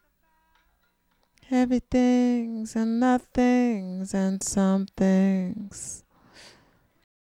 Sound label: diva